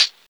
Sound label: short hi hat closed sound D key